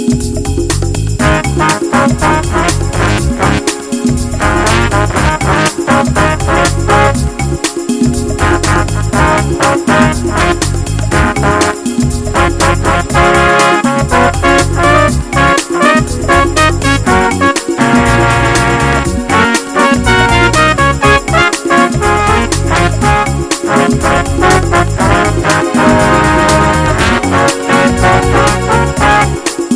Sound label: Happy Swinging